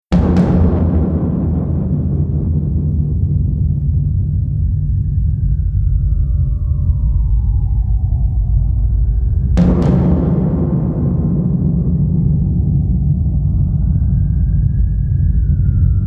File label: big drum